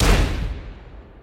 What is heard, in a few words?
AMR Impact